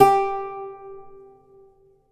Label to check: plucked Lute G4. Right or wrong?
Right